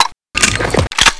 Pulse

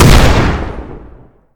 spread red fire; spreadgun

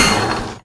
B Saw End

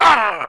injur

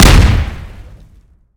hit; hellblazer

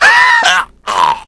death

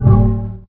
Teleprt; Marisa Kirisame

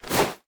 moth attack; mashiro

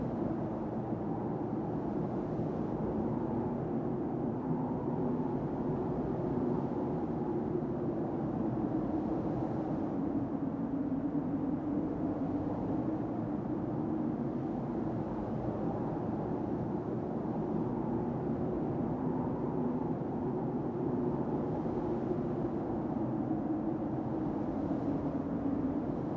vortex wind; ynykron

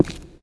stone

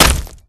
slug hit

